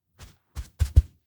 steps outdoor observatory front step out hug; kigurumi; awayuki; anemoi

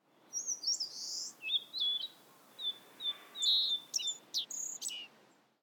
bird forest; anemoi

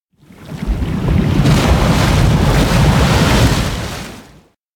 sink underwater; anemoi

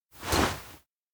trophy show; aino; anemoi

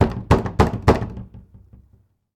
doorknock; anemoi

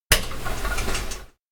door open slide; meguriai; anemoi

